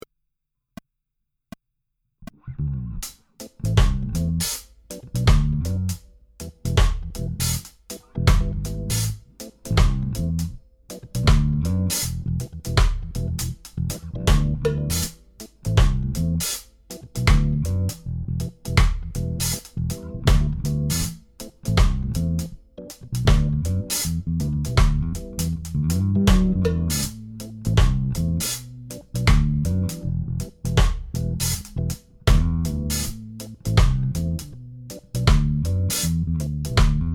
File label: 80 bpm Reggae; Play The Intervals